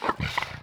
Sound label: baphomet breath